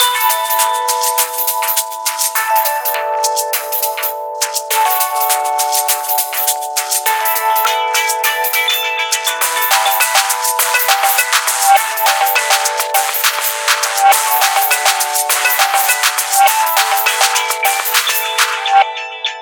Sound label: music optimism, ringtones; Samsung GT-i7680; cmcc